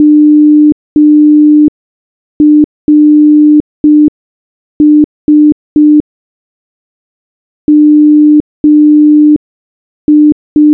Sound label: morse